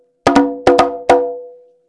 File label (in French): frise, congas